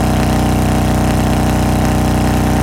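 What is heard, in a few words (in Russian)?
бесперебойный бодрый звук мотора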